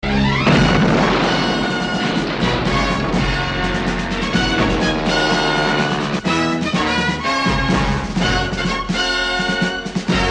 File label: ringtone; a-team